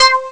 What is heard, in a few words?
Paper, Amiga Soundtracker; ST-XX Amiga Soundtracker Sample Packs